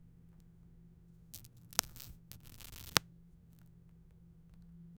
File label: the sound of slipping on, banana